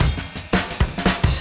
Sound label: Beat Style E 170, breaks; 170bpm